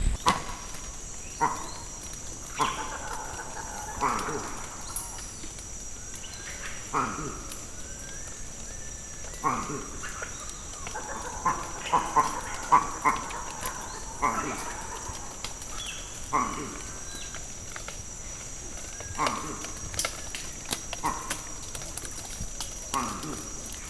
Eagle attack male, campbell, vocalisations; compcog

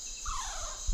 diana vocalisations

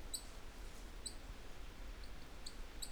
Call B series, titi monkeys; vocalisations; compcog

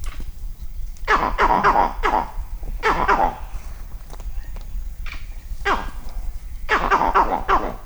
Vervet monkeys Leopard Alarm Call; vocalisations; compcog

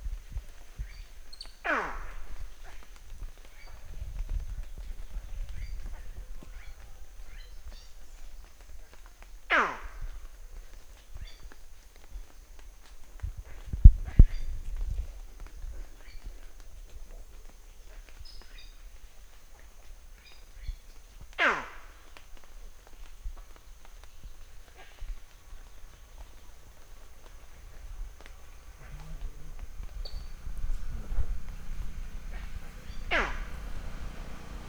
Blue Monkey pyow; vocalisations; compcog

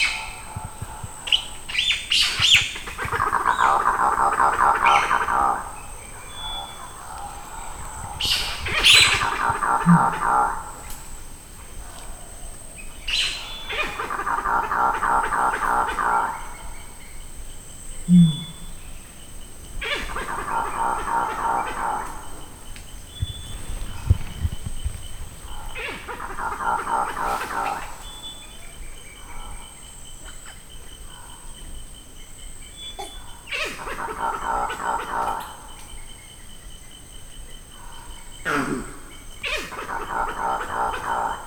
falling tree, campbell vocalisations; compcog

